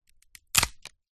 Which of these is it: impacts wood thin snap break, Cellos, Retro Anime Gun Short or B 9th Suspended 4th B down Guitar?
impacts wood thin snap break